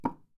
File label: Wood Impact; Phasmophobia